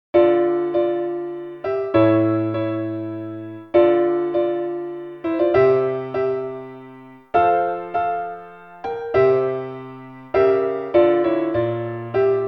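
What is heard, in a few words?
Folia C instr midi version; meespeelmuziek sneller; HaBa WaTaSa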